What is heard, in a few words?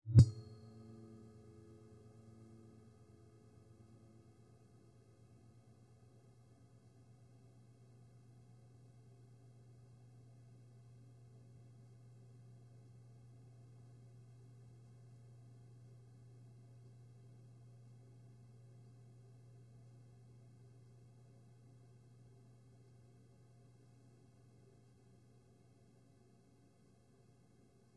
singing bowl; background; misy dźwiękowe